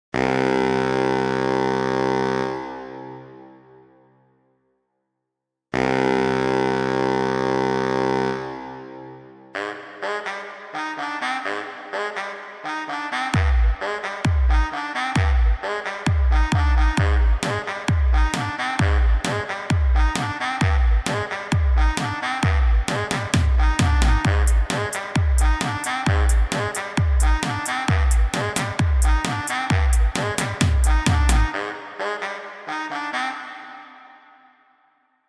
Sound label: brass baritone